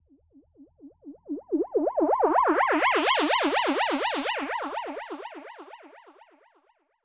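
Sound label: Filter Processed, alarm siren samples 125bpm; musicradar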